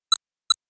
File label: toot